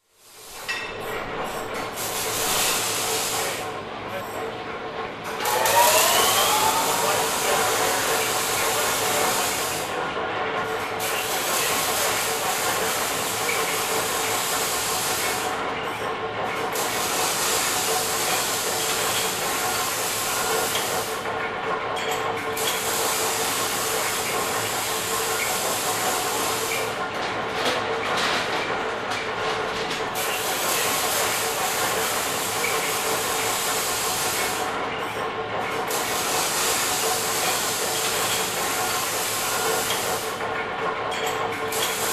Industrial Engine Clanking and Exhaust

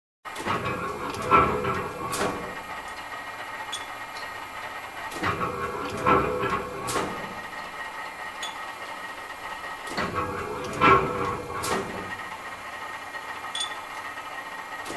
Engine Bearing rolling

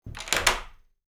Door close

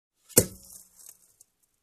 Sewing Machine Drawer close

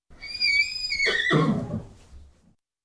Horse Neigh

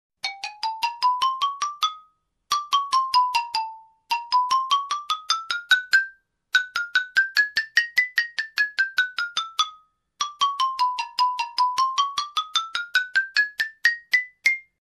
Xylophone scale up and down

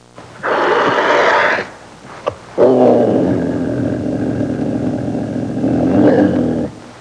Generic growling

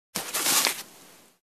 Sack of clothes being dragged

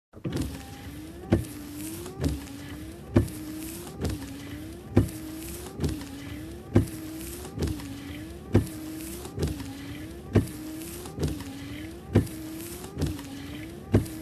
Windshield Wipers

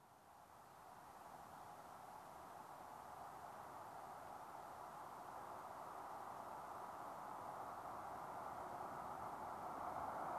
Car approaching and parking

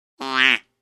Comedy mocking sound

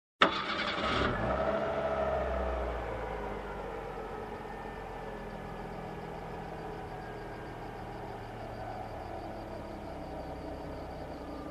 Old car approaching and parking

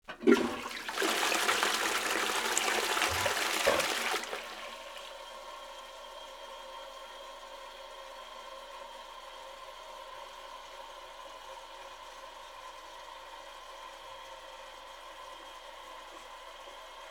Toilet being flushed water filling up

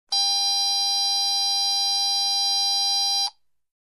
Beep Horn Sound

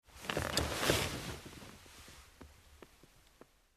Slowly sitting down on Armchair

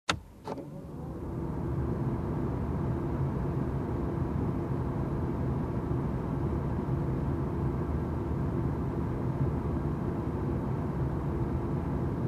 Car Air condition turn on

